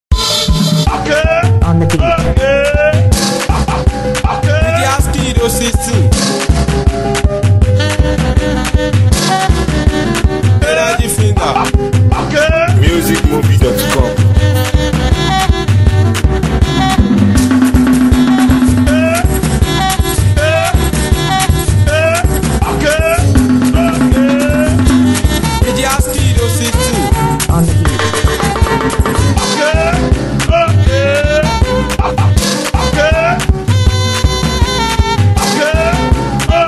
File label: Mara Beat